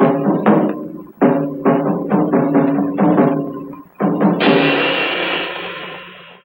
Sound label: Ancient Music FX, Industrial